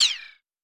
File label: Lasers; Super Smash Bros